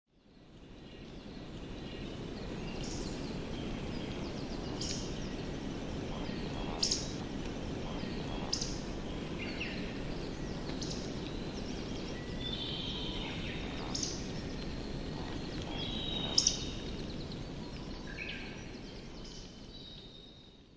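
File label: Eurasian woodcock, furano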